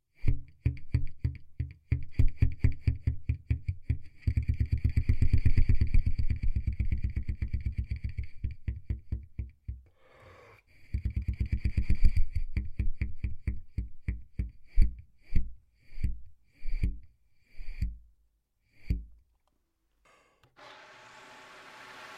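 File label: trumpet air rams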